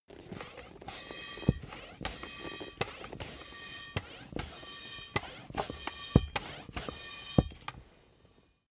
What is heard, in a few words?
soccer ball inflate sequence